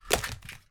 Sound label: toy plastic drop on floor